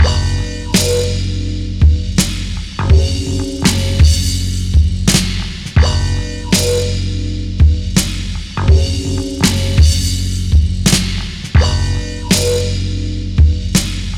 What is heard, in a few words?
Flies Instrumental